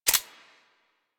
Sniper Rifle Mech; Female

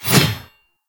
Heavy Scythe Whoosh; Melee; Duviri

